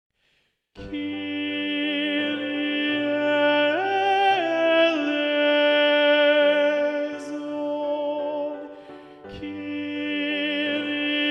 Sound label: Tenor; Mass No2 in G Mvt 1 Kyrie; Practice Room